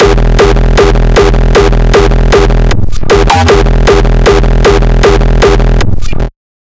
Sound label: kicks loop 155; GhostHack